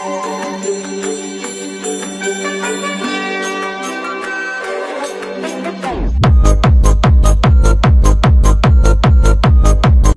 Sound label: Jumpstyle song